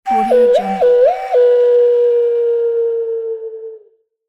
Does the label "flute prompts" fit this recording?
Yes